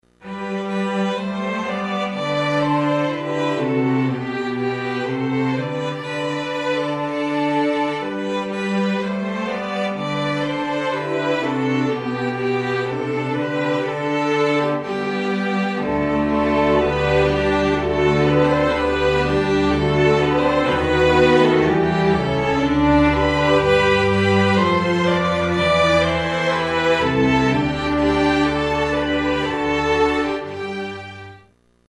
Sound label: classical